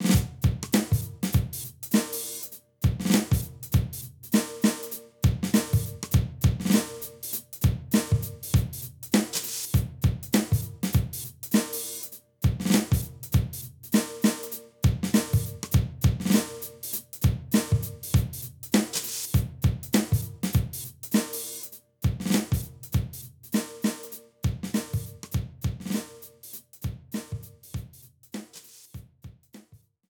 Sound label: Dice BGM Entrance